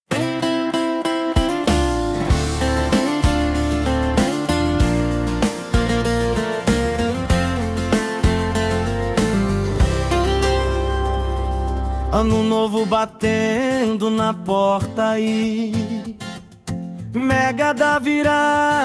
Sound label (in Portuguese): Sertanejo; Mega da Virada